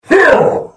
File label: Hit; The Elder Scrolls III - Morrowind